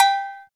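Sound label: percussion cowbell; Roland L-CD701; PRC Latin 1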